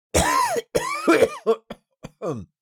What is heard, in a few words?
Male vocalization coughing